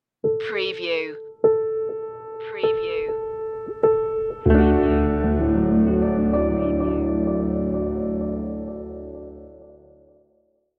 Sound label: angels and hope stinger